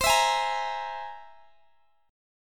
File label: C Augmented 7th, Guitar, Open G